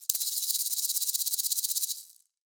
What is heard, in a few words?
maracas Roll; Raw Perc One Shots; Slate; SD SEISMIC Drum Sample Pack